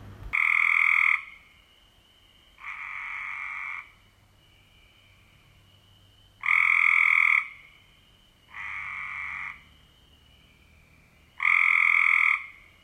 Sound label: Cope's gray treefrog